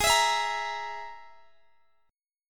Ab Suspended 2nd Sharp 5th Ab down Guitar DADGAD; strummed; Absus2#5

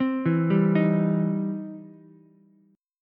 E Minor Major 7th Sharp 5th, E, down, Ukulele

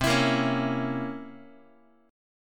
C 7th Flat 9th Guitar; strummed; 4A,4E,5A,5D,5G#,6C#; chord; x,8,7,8,5,x; JGuitar

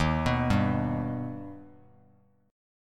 D sharp Augmented D sharp, Bass Standard; arpeggiated; x,6,9,0; JGuitar

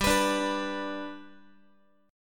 G Major down Guitar; strummed

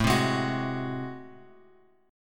A Minor Major 13th A, Bass Standard; strummed; AmM13; 17,15,18,23; JGuitar

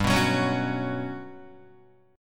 G Major Sharp 11th, Guitar; strummed; JGuitar

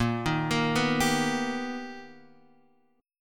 B Augmented Major 7th A sharp, chord

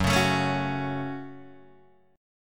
F sharp Minor F sharp, Guitar Open D

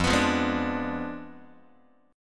F Diminished 7th F down Guitar; Fdim7 strummed; JGuitar

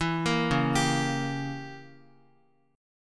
Db Diminished 7th Db down Guitar; arpeggiated; JGuitar

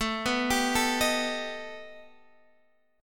B Augmented 7th A

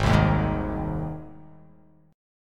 Ab Major Ab down; strummed; JGuitar

